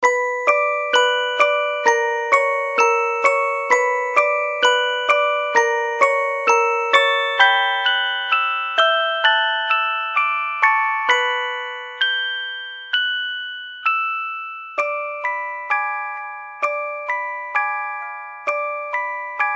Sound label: japanese ringtone; One Missed Call